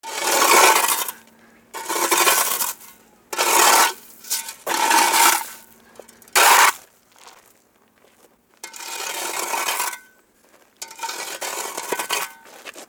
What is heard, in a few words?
industrial tools shovelling gravel; sound effects one